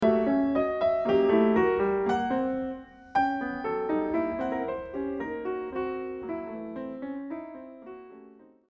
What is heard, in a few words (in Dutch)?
Piano a quatre-mains